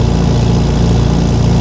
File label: Cadillac Escalade Low